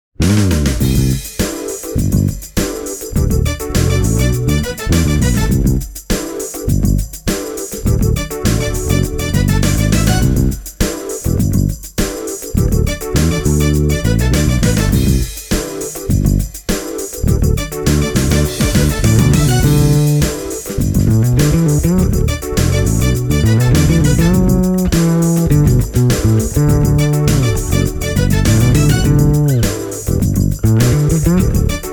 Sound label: 6 str elec double bass; juha savisalo